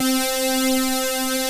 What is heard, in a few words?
METAL KEYS; Pro-Rec - Synthsonic